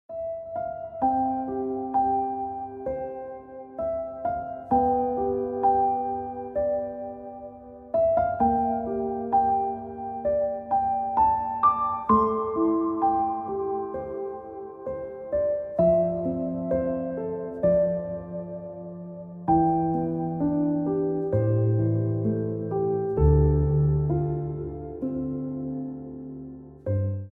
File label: Ringtone Piano Music